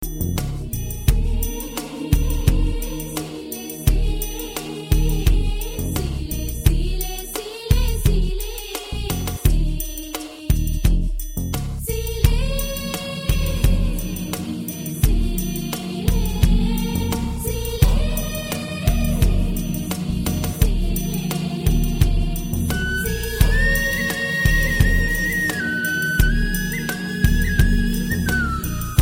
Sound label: rhythm humming flute